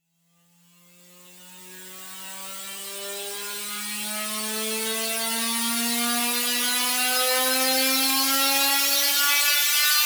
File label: Future Bass Riser, 160 BPM E; Risers; Cymatics